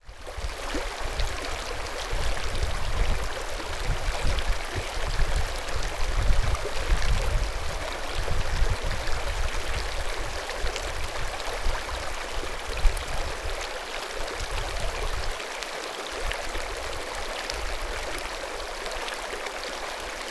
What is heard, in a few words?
WATER brook; Deluun